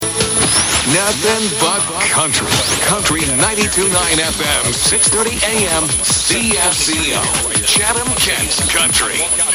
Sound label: clean bumper; cfco